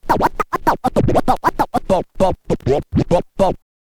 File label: scratch kit, vinyl